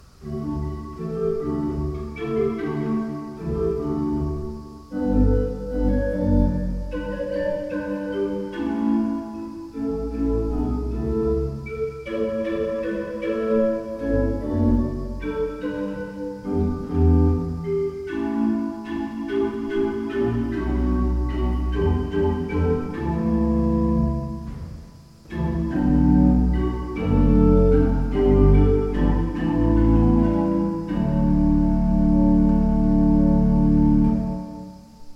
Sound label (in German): Walcker, gebrauchtorgel